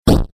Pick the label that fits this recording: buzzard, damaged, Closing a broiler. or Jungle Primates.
damaged